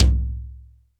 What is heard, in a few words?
TAIKO; Crypton - DrumBase5000; JAPANESE PER